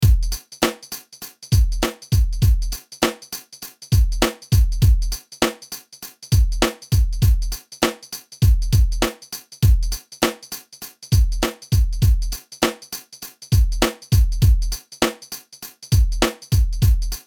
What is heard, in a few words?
LoFi Hip Hop Beat